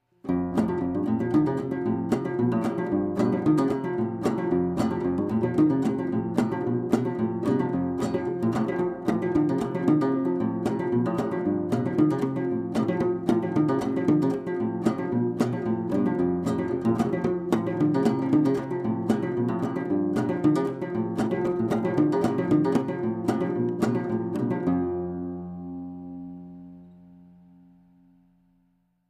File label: minstrel banjo